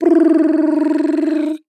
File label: cartoon mouth sound